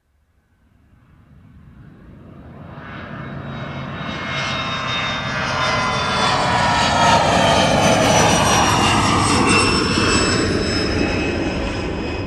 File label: transportation aircraft commercial jet pass low external, Aircraft (Large Jet) Fly Low Overhead; Aircraft - Large Jet Airliner Fly Overhead Very Low; Airplanes (Sound Effects:Vehicles); McKinney Sound